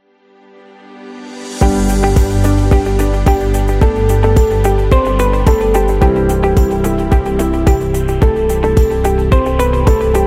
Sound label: Luxury Corporate; motivational speech